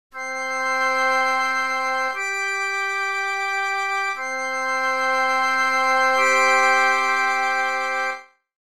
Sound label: interval Fifth